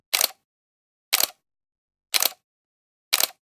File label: Generic Click Metal Medium; boom library; The Interface